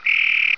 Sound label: copes gray treefrog call; texas nature trackers; amphibian watch